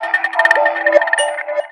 Microcosmosis alert, notifications; Motorola Droid-4

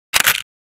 camera click

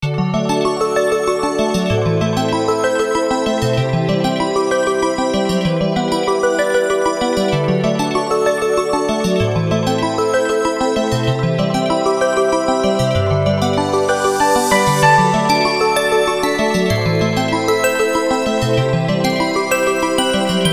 Alarm, phonetones; Saga Alarm; Sony Ericsson; PlusFourSix; Deena (W760)

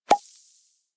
champagne pop; Google; Google Sounds

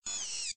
mechanism down, ui

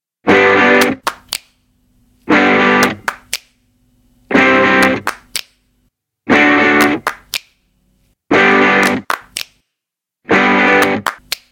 Powertome, Ringtones

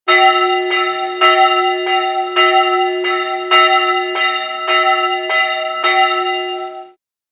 Bell

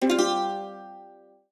Pluck

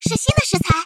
sms; OnePlus 11 Genshin Impact Edition; xiangling

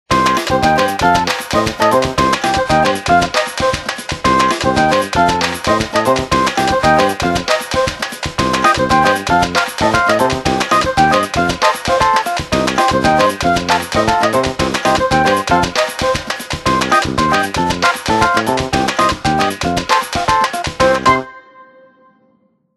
Ring; Individual download-only (2008)

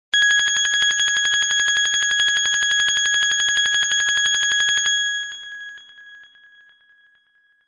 Modern Alarm; Pantech P2020-Ease; Alert Tones

